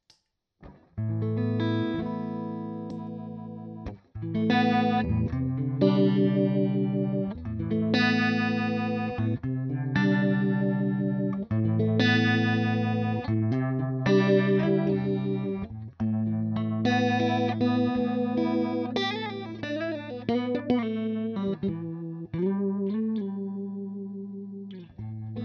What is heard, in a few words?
Chorus + Phaser; Halo